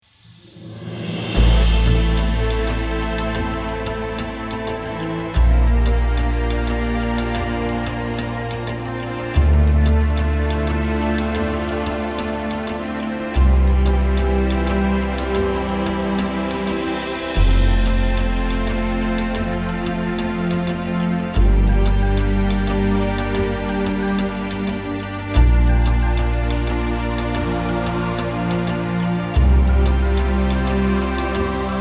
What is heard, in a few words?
Monuments Ohne Chor